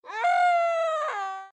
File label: scream; Adventure Time - Elemental; candy